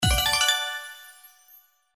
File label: SFX Symbol Wild Win; slots; Panda Majesty2